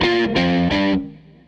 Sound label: Tele Guitar Loop